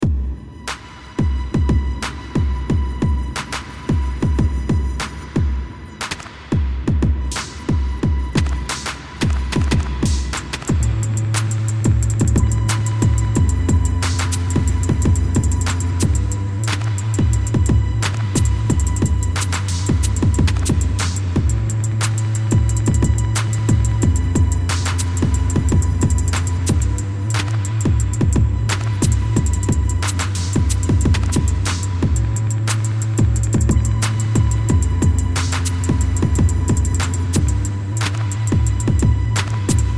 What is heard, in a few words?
Instrumental, backing tracks